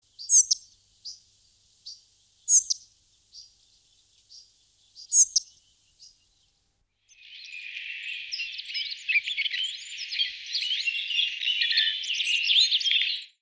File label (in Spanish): Papamoscas gris